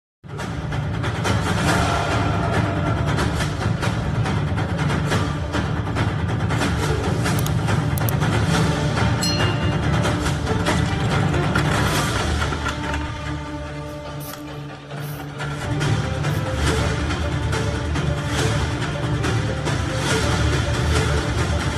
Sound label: fight BGM ringtone; gopichand; vishvam